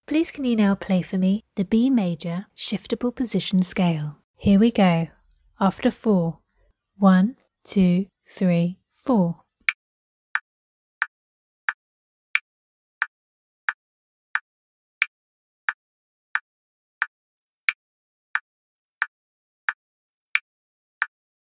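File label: organ, B major shift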